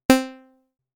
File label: Short, synthlead